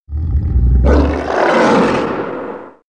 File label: lion roar bgm lion roar sound